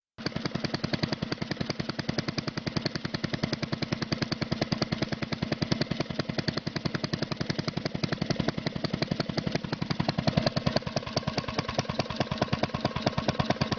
old engine sputter

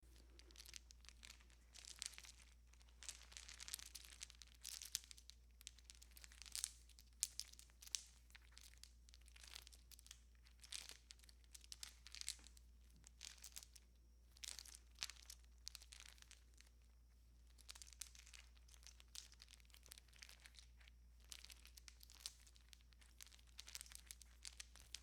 stringing christmas tree lights, foley

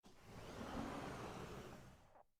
sliding glass door opening

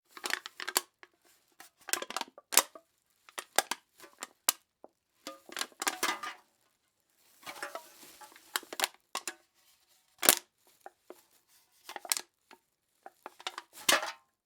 aluminum can crunch series, household

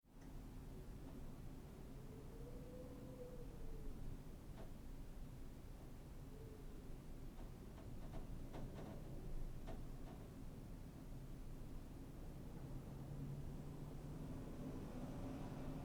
wind quiet creaks

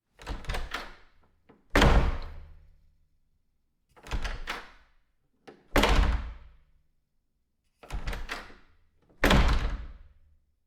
wood door open and close series

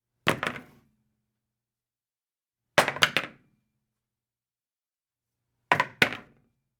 ping pong paddle toss on table

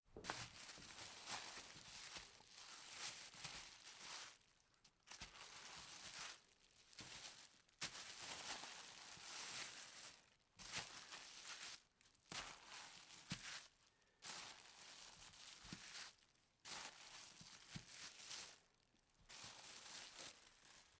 plastic crumpling

